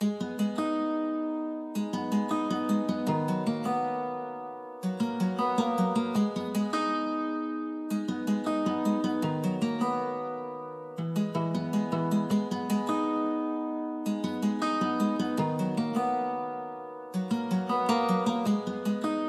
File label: 156 BPM G# Min Guitar; Cymatics; Quest; Destiny Gold Expansion